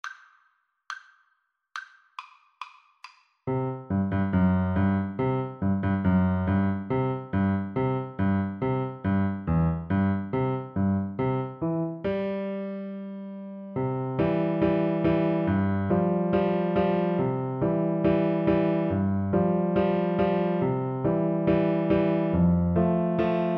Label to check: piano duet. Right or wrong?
Right